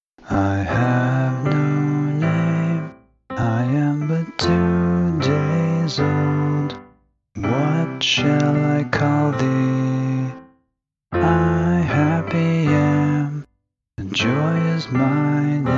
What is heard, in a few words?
scratch vocal